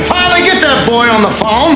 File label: Phone